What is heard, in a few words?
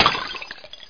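high glass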